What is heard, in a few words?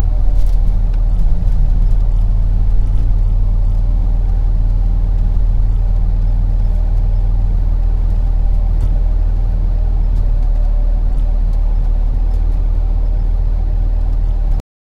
stationary nissan skyline; birds chirping